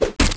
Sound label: MOB BATTLE ARROW, Battle Mob Weapon